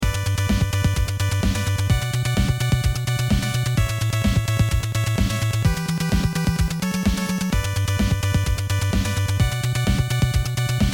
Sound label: arpy beat